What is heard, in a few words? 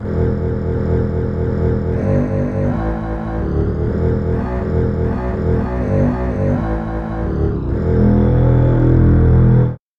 slow strings bass